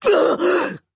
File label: damage